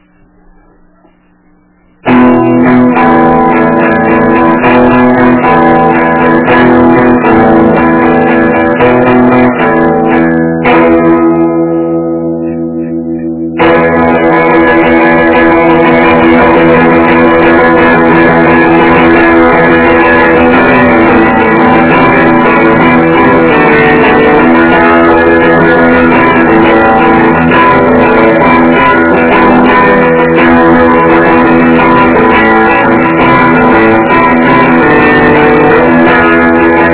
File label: winter guitar